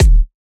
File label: kick